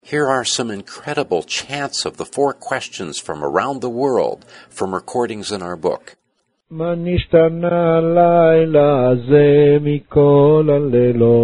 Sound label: chants